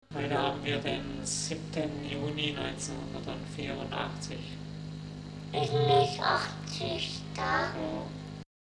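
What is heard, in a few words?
redsquare vocoder; RSVocoda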